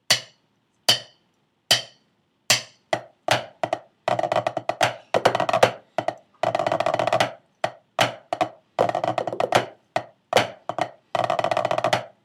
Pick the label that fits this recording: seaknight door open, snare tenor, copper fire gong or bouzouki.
snare tenor